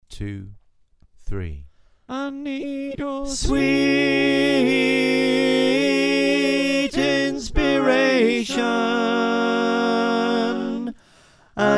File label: Tenor, choir